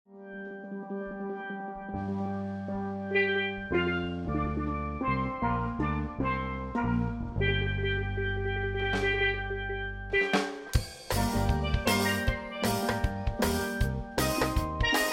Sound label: Reggae